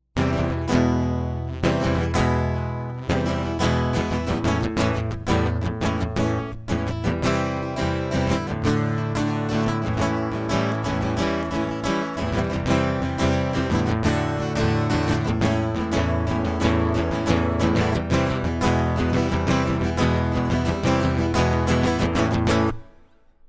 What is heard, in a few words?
guitar